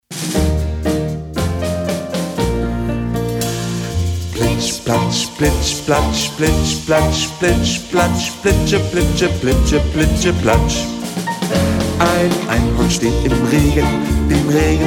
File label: Bass